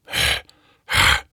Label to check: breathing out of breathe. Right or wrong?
Right